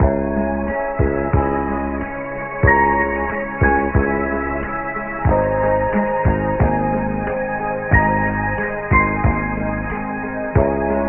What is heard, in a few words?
strings, Sad